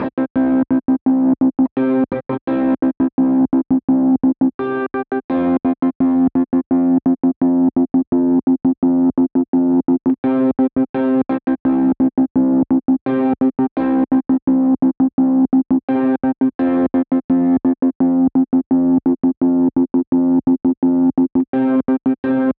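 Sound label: Trance guitar, A minor, 85bpm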